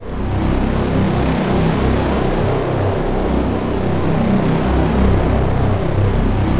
AMB EVL RE; Synclavier Sampler Library; KEYS AND GUITARS

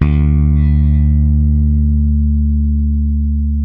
ROCK D 3, Bass, ROCKER; Spectrasonics; Bass Legends

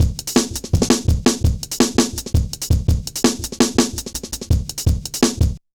Jazz Shuffle, Drum Loops

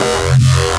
Reese Bass F; Antidote Advent

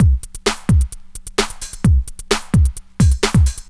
drt 130 electro, DRUMLOOPS, LOOP ELECTRO

